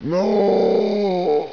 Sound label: die; svencoop; hunger; hgrunt